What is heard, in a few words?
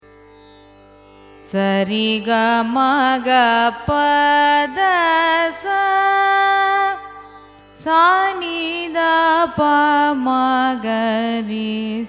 raga karna ranjani arohanam avarohanam; carnatic music